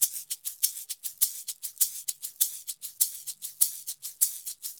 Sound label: Eggs 100, Drums & Percussion; Best Service; Festa Latina VOL-1